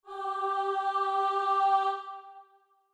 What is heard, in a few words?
starting note, voice